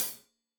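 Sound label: hat closed, hi-hat cymbals stereo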